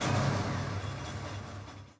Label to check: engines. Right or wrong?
Right